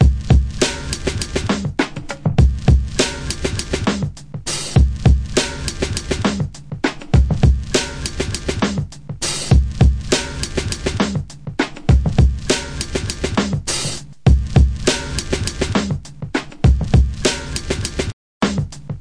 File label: 101 bpm groove breaker, drum loops and breaks